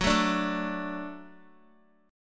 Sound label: F sharp Minor Sharp 5th F sharp Guitar; strummed; JGuitar